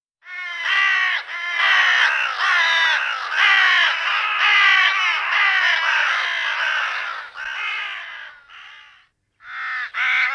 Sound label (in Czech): Vrana seda cerna evropska Corvus cornix corone corone